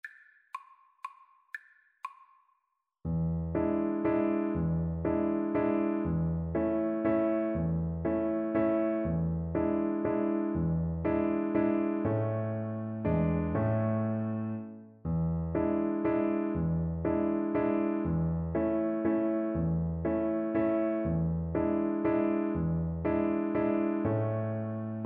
german dance violin; schubert

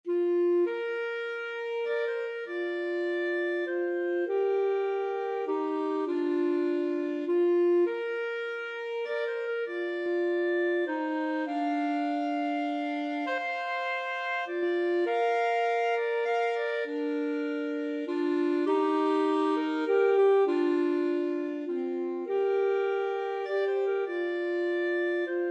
amazing grace, clarinet saxophone duet

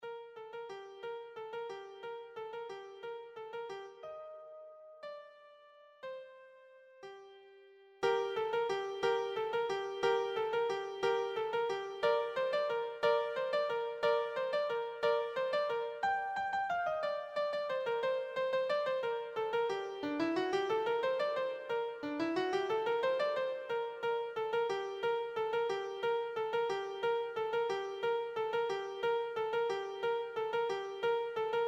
carol of the bells, keyboard

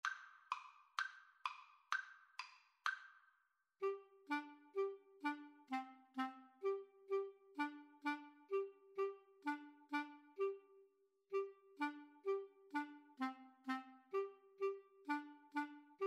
tarantella, Clarinet Duet

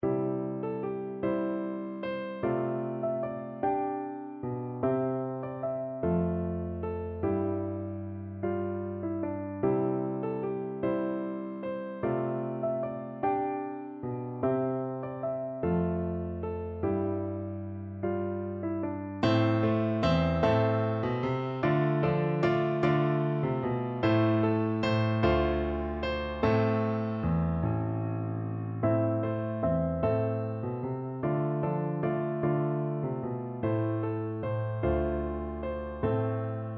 skyeboat, piano duet

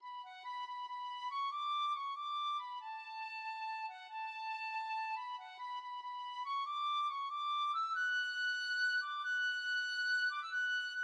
tin whistle

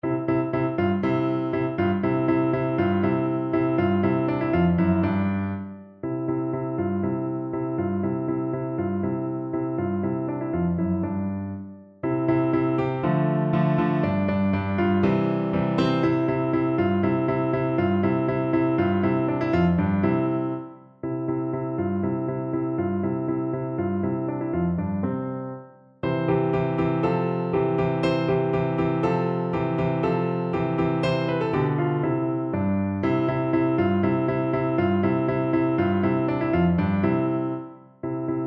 vivaldi autumn piano

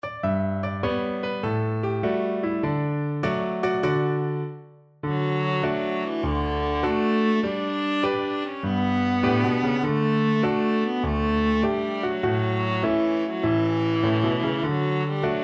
click go the shears, viola

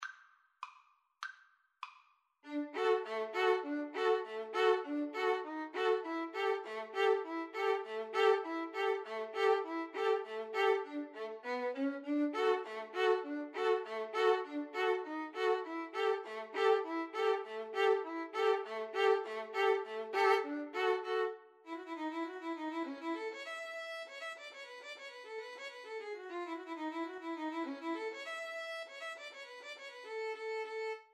clarinet polka, Violin Duet

